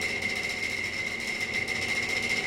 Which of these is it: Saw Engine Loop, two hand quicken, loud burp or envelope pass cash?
Saw Engine Loop